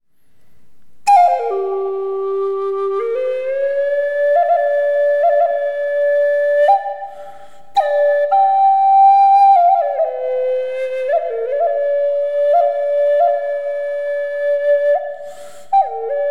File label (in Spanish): 3 maderas reverb